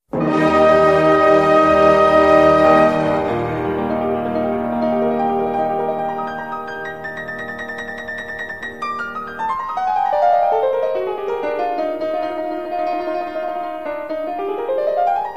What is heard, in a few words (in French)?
concerto piano; Beethoven